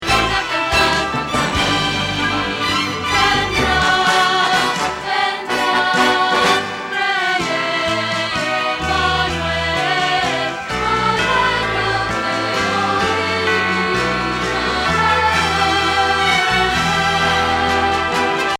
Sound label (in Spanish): Contralto